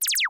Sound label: beep